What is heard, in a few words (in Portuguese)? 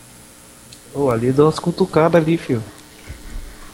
cutucada